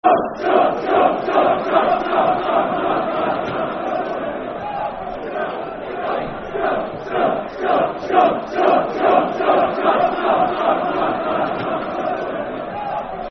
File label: Scum, Scum, Scum [Football Chant (Soccer Song)], Dunfermline; Dunfermline Dafc